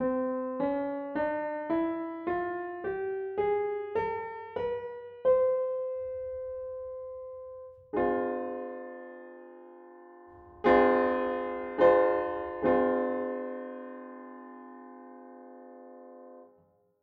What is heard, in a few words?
Orwell Nonatonic POTE